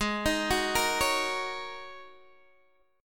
G sharp 7th Suspended 2nd Suspended 4th, Guitar, Open D; down; chord shape